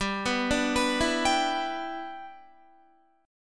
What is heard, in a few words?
G 6th, Guitar; G6 arpeggiated; 14,14,14,16,16,15